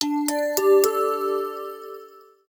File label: Ready; Samsung SM-W2019